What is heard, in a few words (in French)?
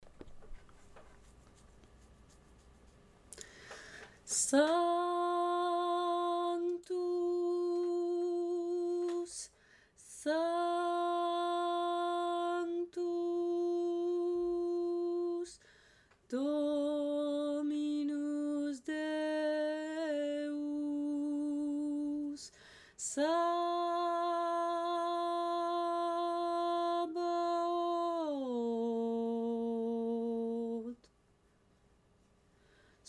bassu; Ateliers chant; sanctus